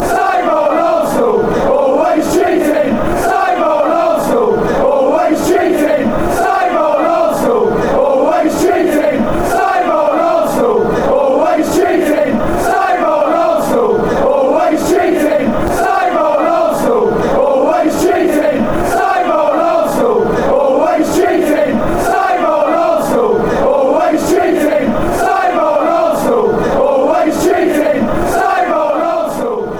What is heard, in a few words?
same old always cheating, fan chants; arsnal